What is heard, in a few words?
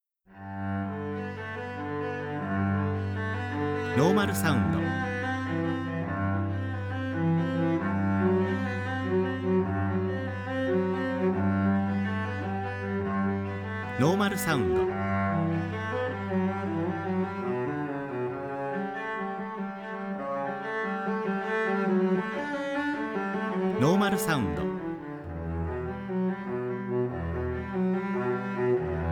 strings